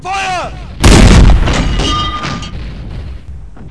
105 fire, weapons

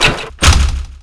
switch

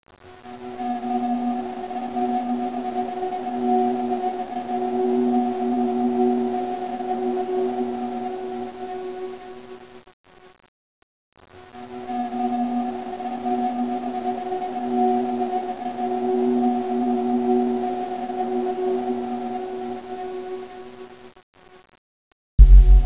frost tomb, ambience

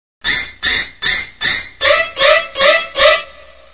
psycho, horror

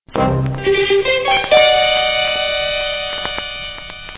music sfx

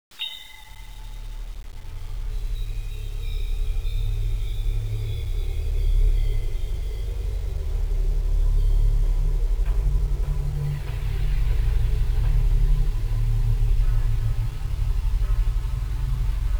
horror music

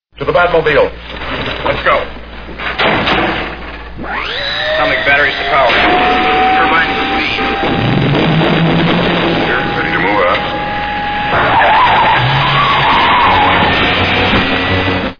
batmobile

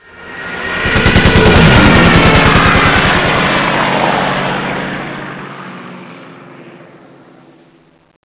plane fight

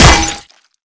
headshot

